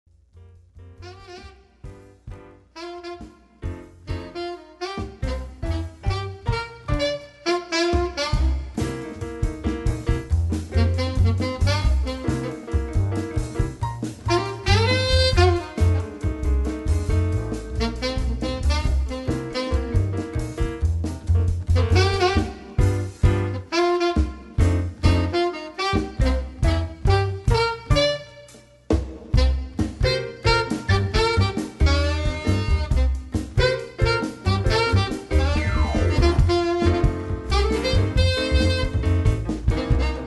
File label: quartet, Jazz